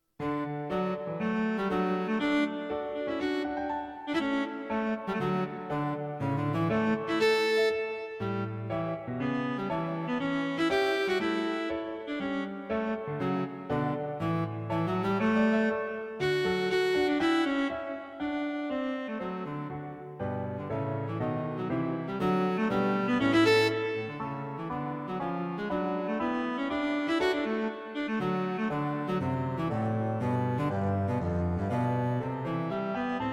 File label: Cello and Piano; The audio clip is from No.3